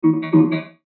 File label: MOUSE OVER, Mouseover Robotic Synth Button Press; Multimedia; Blastwave